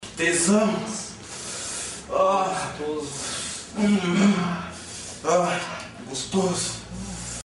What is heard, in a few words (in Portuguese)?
uooh; que tesao